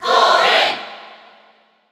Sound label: Cheer English; Corrin; SSB4; SSBU